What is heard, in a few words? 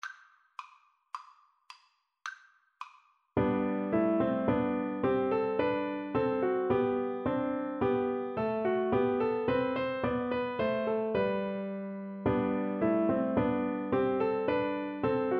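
musette VLC karaoke, Cello; bach; Eng Suite 3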